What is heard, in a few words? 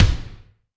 Kik Thrash, Drum Machines Alesis D4